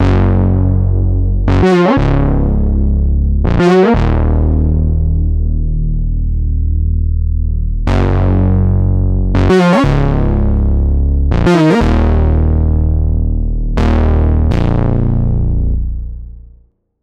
Dirty Detune, bass synth; Wave Alchemy - Bassynth 1.1.0

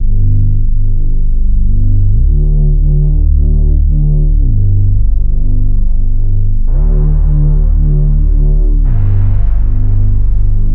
Hybrid Glide, bass synth; Preset: Hybrid Glide; Wave Alchemy - Bassynth 1.1.0

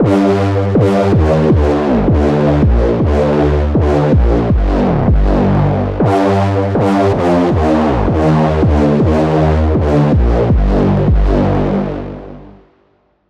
Undulating Beast, bass synth; Wave Alchemy - Bassynth 1.1.0

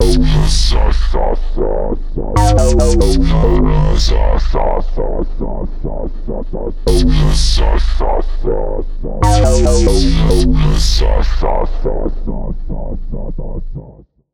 Acid Trip, bass synth; Wave Alchemy - Bassynth 1.1.0

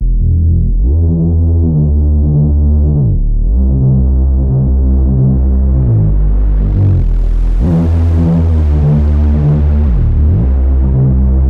Dark Glider, bass synth; Wave Alchemy - Bassynth 1.1.0

